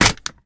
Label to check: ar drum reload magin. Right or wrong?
Right